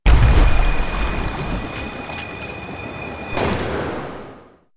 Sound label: Pounce; Slark